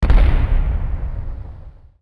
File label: rocket; PC Gamer IT CD 45; SEED demo; hero